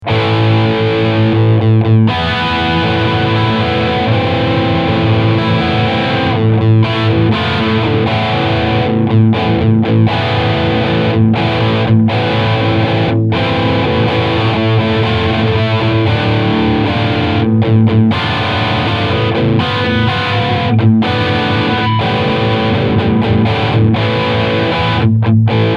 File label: Cannel Crunch